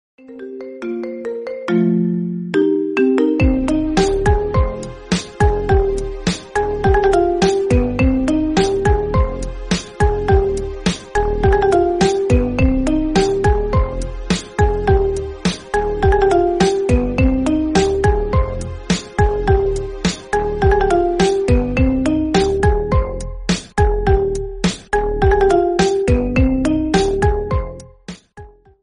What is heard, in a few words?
Marimba Ringtone Remix